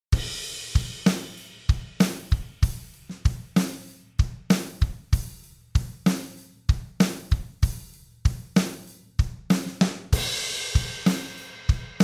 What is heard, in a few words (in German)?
Drum unbearbeitet